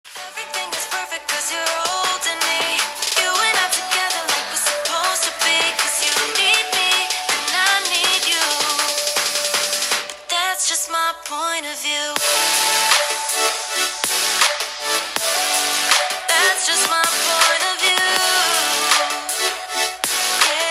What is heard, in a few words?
LG WING Speaker